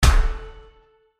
Hit Thud Strings; Sound Design; Blastwave

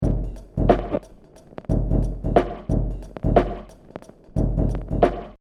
Hip Hop Drum Loop 90 Bpm Swing Lo-Fi; Drum Loops; Next Door Swing Lo-Fi; Royalty Free Drum Loops

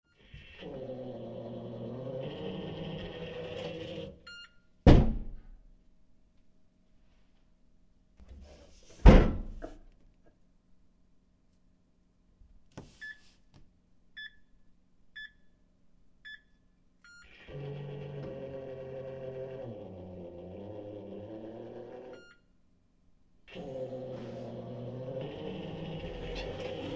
Safe Hotel Room Digital Motorized Open And Close; Door Open And Close - Beeps Keying In And Out; Miscellaneous Door Sound Effects

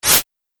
Swish Abrasive And Fast, whoosh

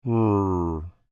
Creature Speak Groan Short, Human; Groaning; Voice; Creature,Human,Speak,Groan,Short

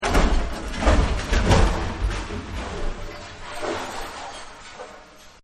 Hitting It Hard; Objects; Partners In Rhyme

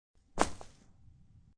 Flwrs Rug, Flower bouquet dropped onto rug; Flowers Dropped on Rug 2; Thuds; Objects

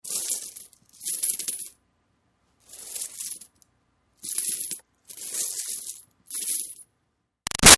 Foley Coins Sweep Around Drop Light; Money; Objects; Big Room Sound

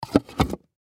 Gift Box Lid Close; Gift Box Lid Close Fast; Lid Sound Effects; Objects; Foley

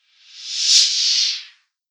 Thin Reverse Whoosh; Whoosh Sound Effects

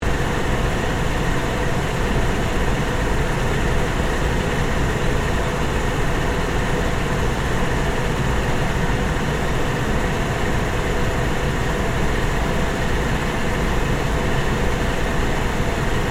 Industrial Fan Motor, Run, Loop; Factory Sound Effects; Factories

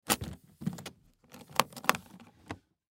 Trunk Open With Furniture; Rustling (Sound Effects:Objects); Blastwave; Foley

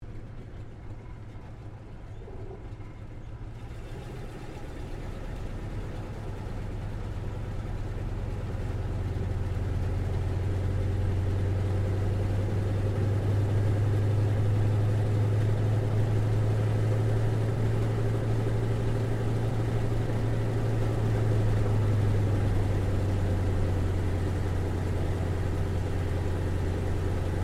AIRCRAFT CA-28 CERES PREFLIGHT INTERIOR; Airplanes; Vehicles

